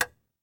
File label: METRONOME